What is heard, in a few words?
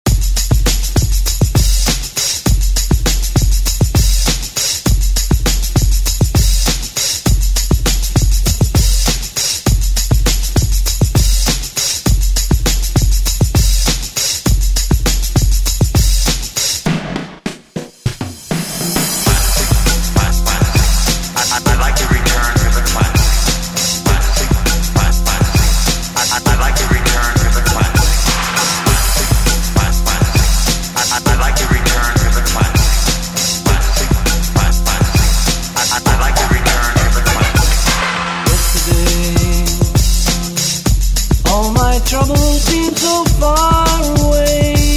Rock Redrum; Deejay Hit